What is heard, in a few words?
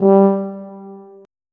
tuba note